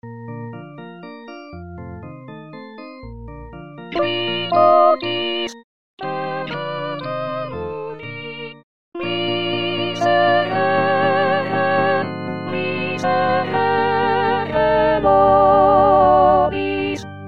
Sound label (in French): Agnus Femmes; Haydn Kleine Orgelmesse